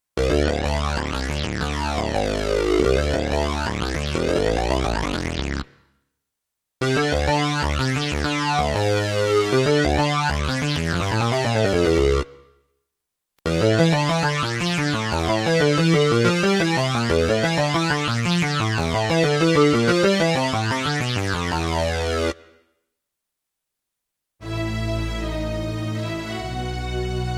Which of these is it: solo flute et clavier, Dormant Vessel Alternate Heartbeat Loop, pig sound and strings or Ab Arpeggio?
pig sound and strings